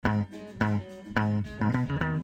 Clean Guitar

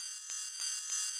clicks and hi hat; Multitrack

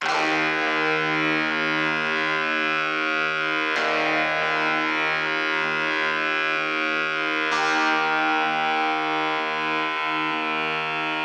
Guitar Intro

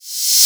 EDM 128BPM Percussions; Shockwave; Essential Studio Weapons vol 2 - EDM; Drum Hits

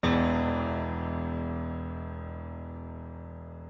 piano hit

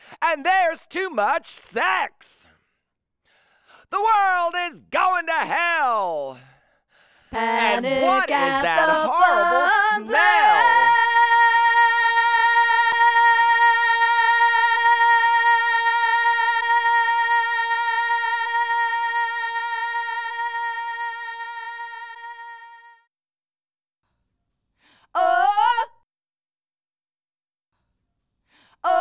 Vocals

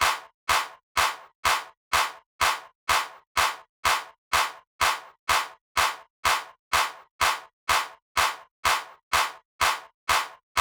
clap

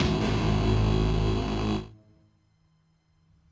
Drone

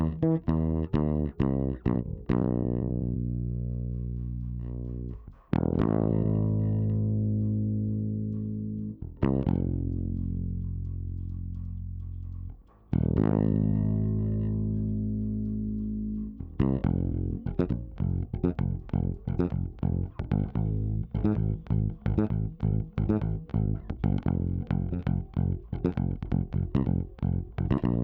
Bass Funky Coda

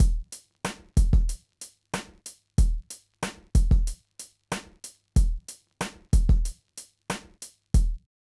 Drums

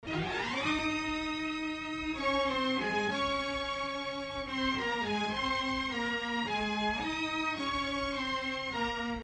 Strings

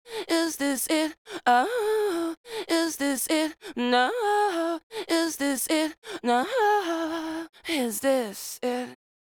lead vocal bridge; Multitrack

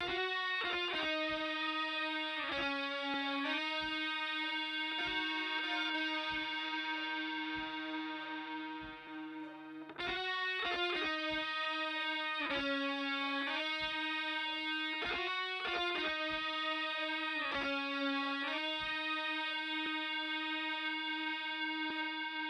Guitar Chorus Intro

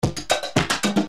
snares

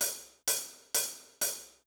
hat loop

